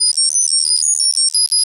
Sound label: Science Fiction Sci-Fi Electronic Data Stream